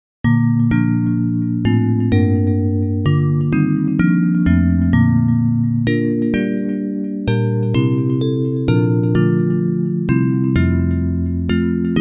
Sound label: Bells